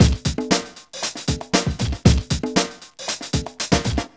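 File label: 117 bpm, Funky loops; Zero-G; Funky Elements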